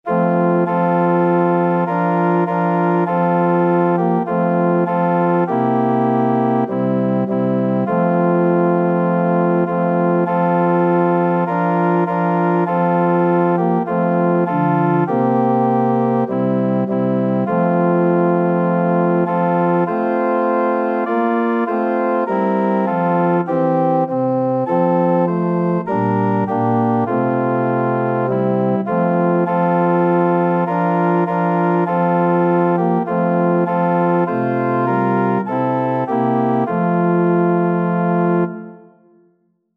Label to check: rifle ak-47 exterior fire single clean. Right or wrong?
Wrong